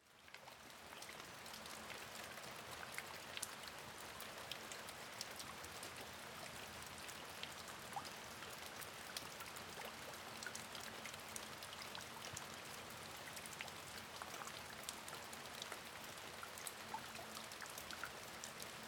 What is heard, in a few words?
rain puddle; Let regn drypper i vandpyt, 30 minutter